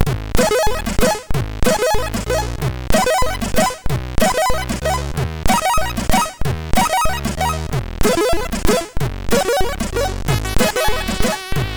Strange Rythms, sid MOS6581R3

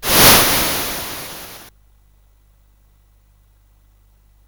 Taipan sfx, CSG8580R5

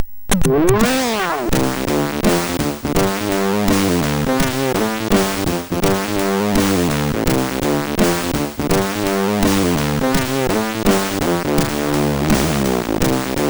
Jingles, MOS6581R4; Deek; hvsc

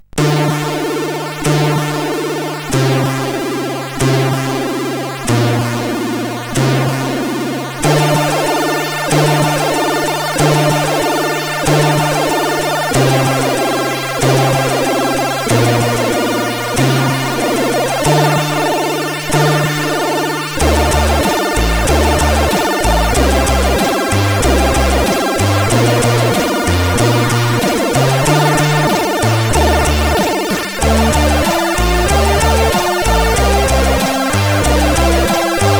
Music Box tune, CSG8580R5

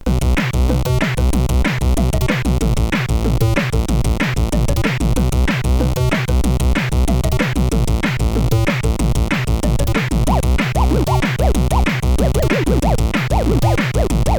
Music, CSG8580R5

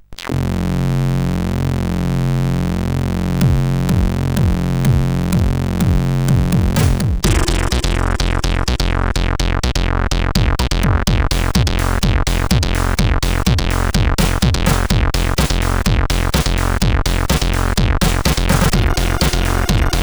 Loading sid CSG8580; hvsc

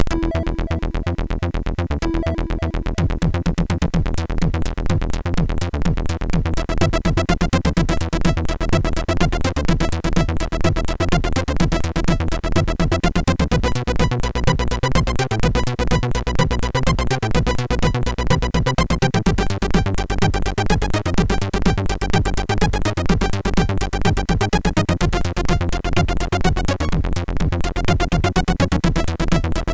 Techno, MOS6581R4

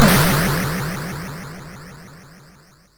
Trance, CSG8580R5; Trance Sector Ultimate

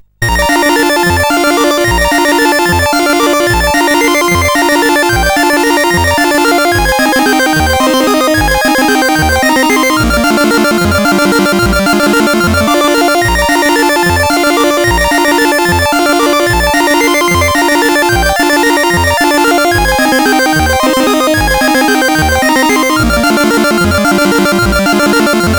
Roller Coaster, CSG8580R5; BOGG

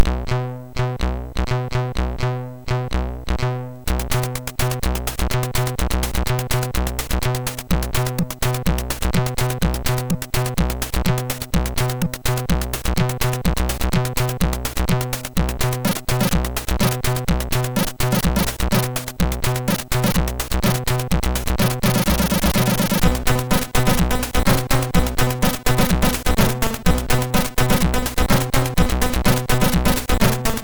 Digi Collection sid MOS6581R3

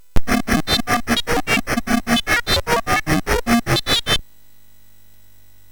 Final Synth Sample, MOS6581R3; hvsc

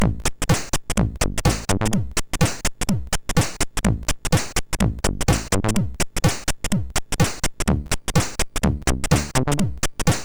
Dusty Metal, MOS6581R4

